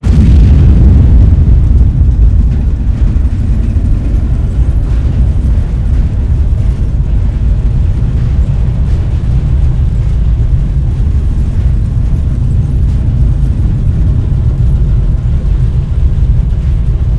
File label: b4 disaster